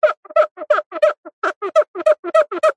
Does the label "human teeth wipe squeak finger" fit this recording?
Yes